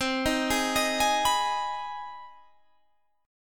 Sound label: C Minor Major 7th Sharp 5th, Guitar, Standard; CmM7#5 arpeggiated; JGuitar